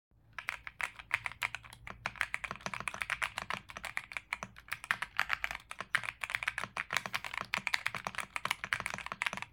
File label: epomaker qk81